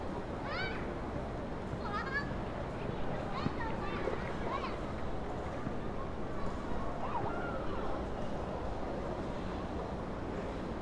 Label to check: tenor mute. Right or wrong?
Wrong